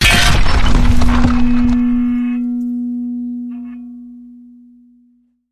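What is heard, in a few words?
destruction crash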